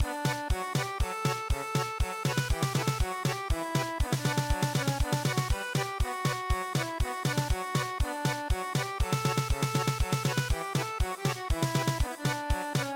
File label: German Polka, traditional